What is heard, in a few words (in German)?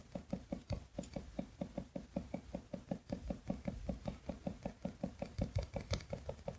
vibration tippen, Vibrationsmotoren im Smartphone; google pixel 4; So groß ist der Unterschied zwischen Vibrationsmotoren im Smartphone